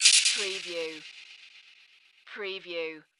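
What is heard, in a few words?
Production Element Chopper; Sound Effects Bible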